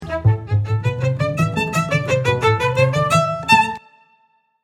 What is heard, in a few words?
Crescendo; Anitra